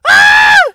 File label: ponys scream